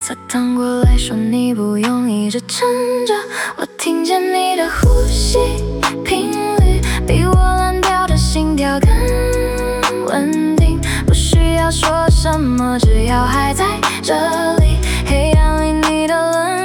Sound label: hook mixed